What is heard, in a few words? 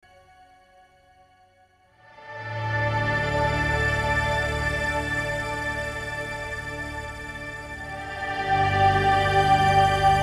Kemper Profiler Stage Reverb